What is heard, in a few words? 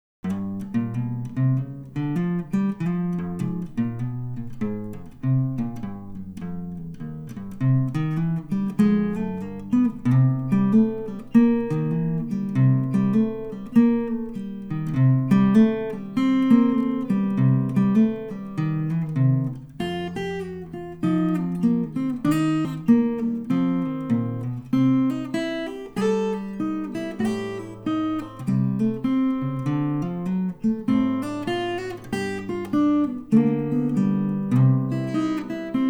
country road blues